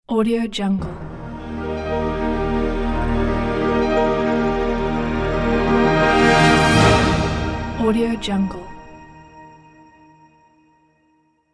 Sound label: cinematic build logo